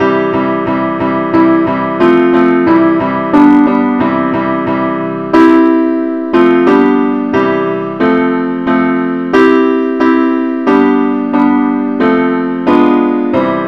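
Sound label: simple accompaniment, key C; Father, we praise Thee, now the night is over; christe Sanctorum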